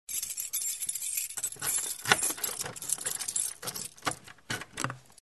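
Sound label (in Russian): zvuk svyazki kluchei i zamka, sound of a bunch of keys and a lock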